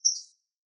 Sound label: Bird Chirp; SoundsCrate